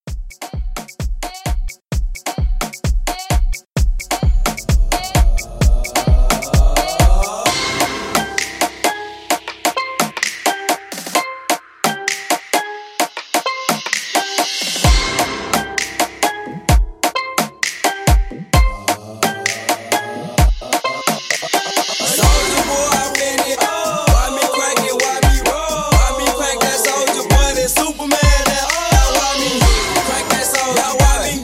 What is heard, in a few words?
Afrobeat Intro Bootleg 130